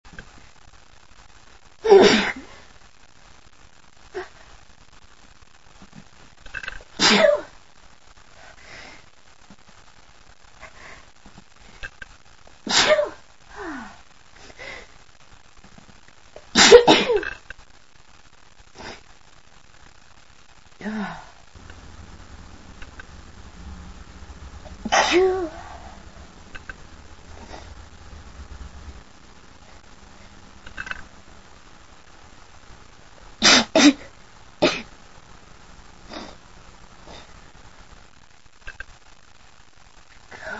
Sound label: very sneezy day, female